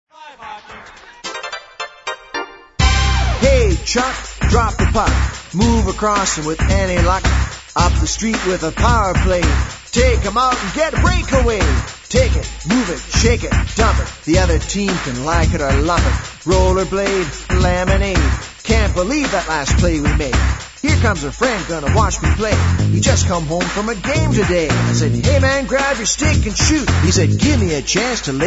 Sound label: roller blade rap